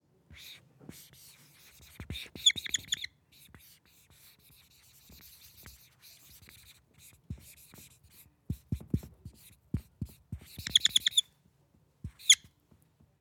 Dry Whiteboard Marker On White Board

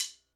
Sticks High Pitch

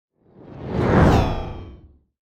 Cinematic Woosh

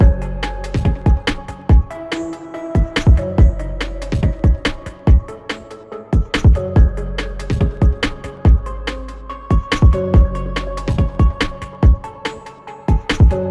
Drums Synths 050 Bpm

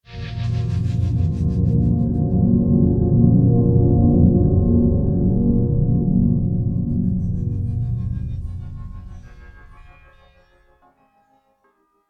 Scary Muddy Resonance

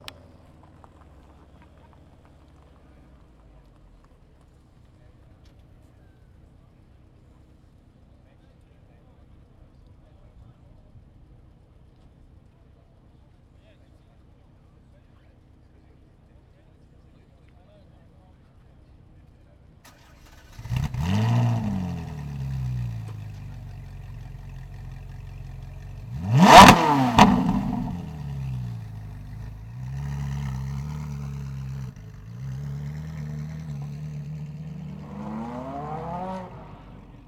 Ferrari Start Up And Drive Off

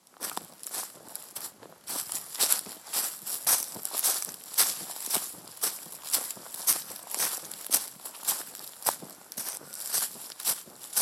Walking In Leaves

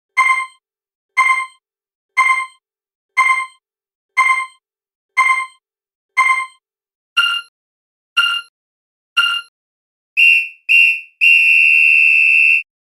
Countdown Timer

